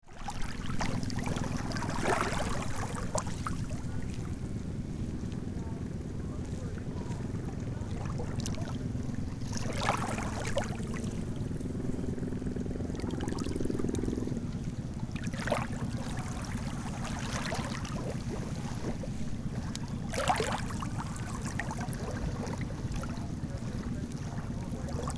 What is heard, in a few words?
Boat ambience waves lapping in close perspective